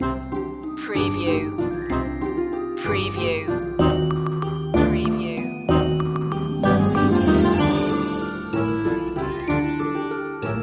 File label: Symphony